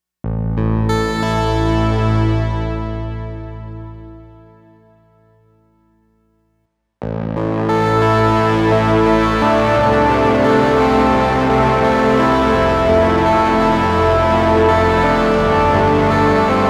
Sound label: synth